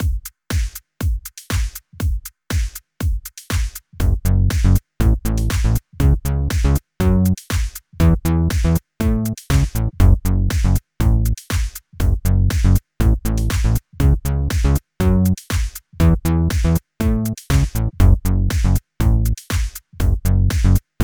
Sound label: Bass Digi; FL Studio